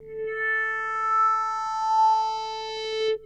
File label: Guitar Textures